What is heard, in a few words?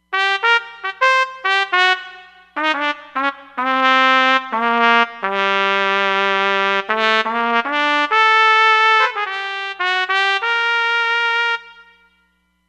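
Solo Trumpet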